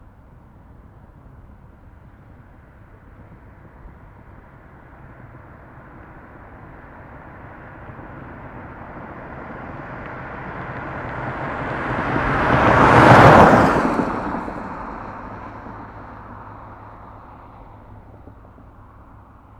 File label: Generic Car, Pass By, Fast, Desert, Ext, MS; Poetry Project